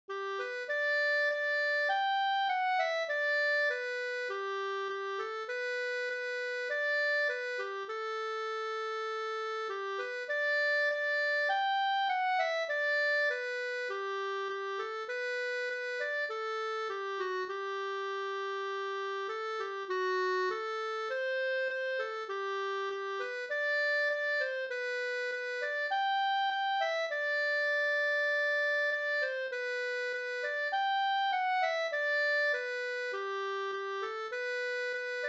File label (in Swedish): vismelodi